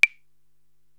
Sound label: Clave, Drum Machines